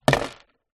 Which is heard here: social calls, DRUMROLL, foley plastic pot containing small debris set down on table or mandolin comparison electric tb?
foley plastic pot containing small debris set down on table